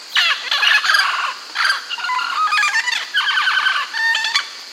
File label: Mealy Parrot